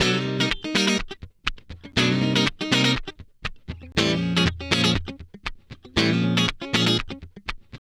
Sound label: Guitar Licks; Best Service; ProSamples vol.17; AKAI